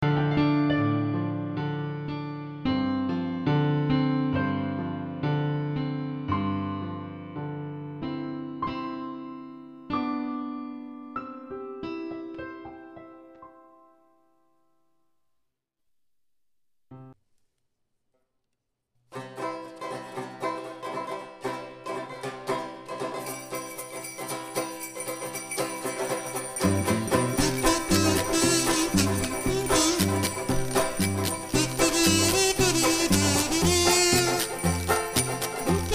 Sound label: grandmas band jingle